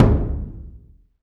HYBRID, Heart of Africa; Spectrasonics; AF.HYBRID

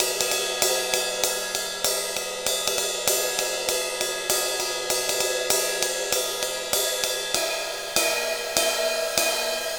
Ride Cymbal Pattern

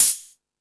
Fusion Stix, E-MU Pro-Cussion; drum machine

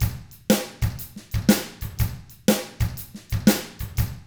SHUFFLER, Drums; ILIO; Double Platinum Drums 1; 122 SHUFFLER

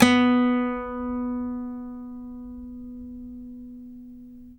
GTR B2 MED, Nylon Guitar

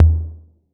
808 SOFT; Wizoo - Platinum 24 Electronic

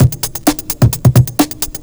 ELECTRO; USB Soundscan vol.25; Big Beat Drumloops; AKAI

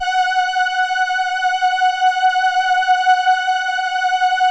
LAMBDA, String Machines

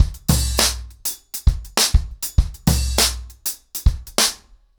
100BPM, ACOUSTIC DRUMS

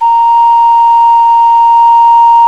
OCARINA A#2; Exotic Winds

